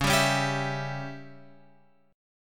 C sharp Diminished C sharp

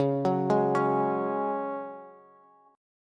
Db Suspended 4th Db, Bass; arpeggiated

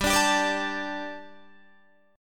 G Suspended 2nd G, down, Guitar, Open D

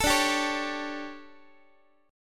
D Major 7th Suspended 4th, Guitar; DM7sus4 strummed

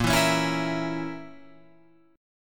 A sharp Diminished Guitar Open F, chord; strummed; JGuitar

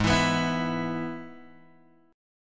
Ab Minor Sharp 5th, Guitar, DADGAD

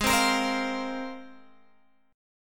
G sharp 6th Add 9th G sharp Guitar Open G

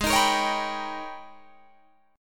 G sharp Minor Major 11th, Guitar Drop D; 18,18,20,18,20,19

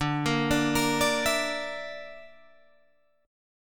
D Suspended 2nd Sharp 5th D, Guitar, Drop D

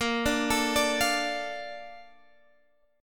A sharp Major 7th, Guitar Standard; A#M7; arpeggiated; JGuitar

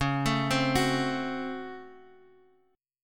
C sharp Major 7th Suspended 4th Sharp 5th C sharp; arpeggiated; chord; 21,24,22,23

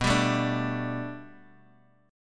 B-Minor 7th Sharp 5th B down Guitar Open G

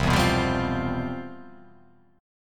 C Major 11th C down; CM11 strummed; JGuitar

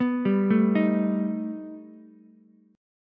B Augmented 7th B down Ukulele; arpeggiated; JGuitar

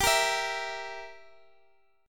G Minor 6th

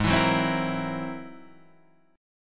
G sharp Augmented 9th; strummed; 4,7,4,5,5,6; JGuitar

